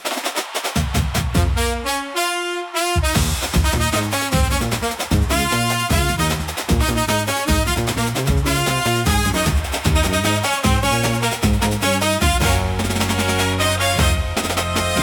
Carnival Parade, upbeat carnival; for festivals and parades

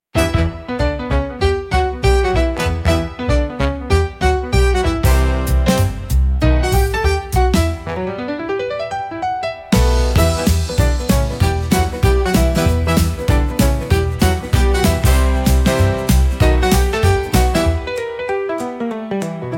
Brassline Boogie, big band swing

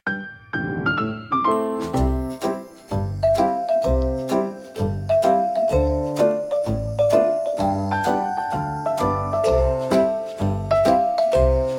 toy piano march for kids pets videos

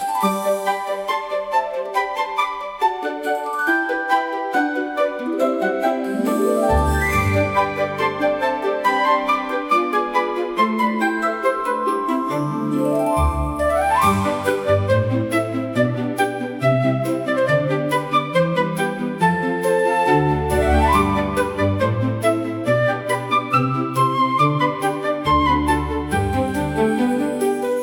fantasy music cute bright; disney style; fun fantasy world

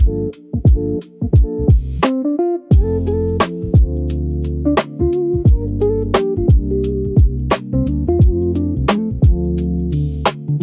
Sidewalk Sketches, lofi jazz hop

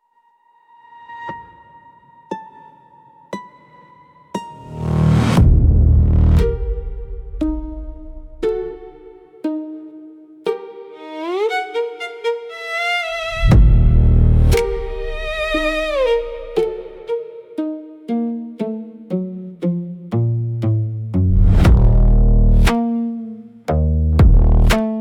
Glass Halo, spiritual spooky soundscapes

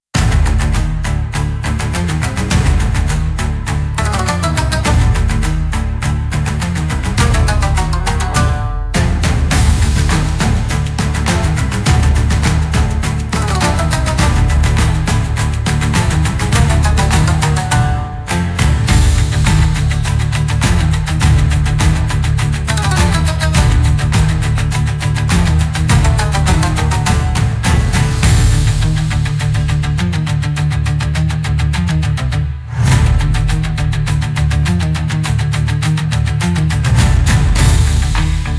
Kamikaze Run, cinematic japanese style battles

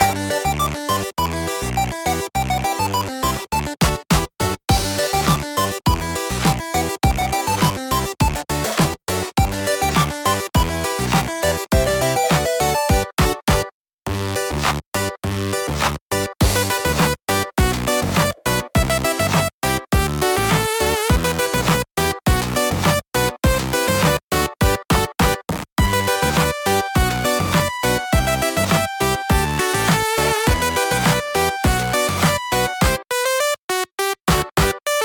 retro game chip tune; pico pico sound

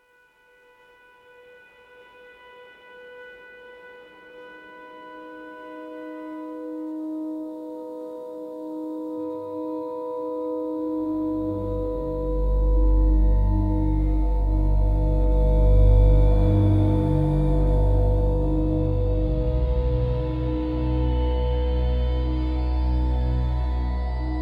spiritual spooky soundscapes

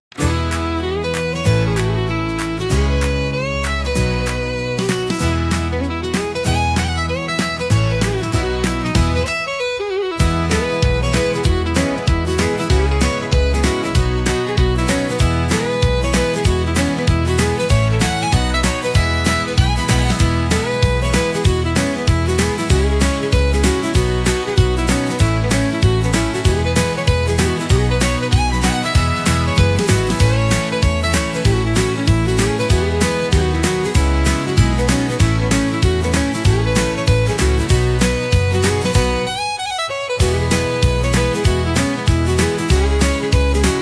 Alehouse Jig, irish pub rock

